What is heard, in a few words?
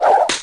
woosh smack